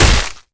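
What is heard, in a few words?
Damage Mace Bone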